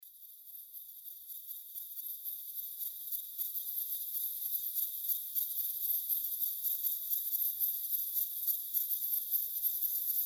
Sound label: insects